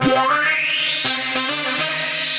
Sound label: Horn Sect Effect; Sound FX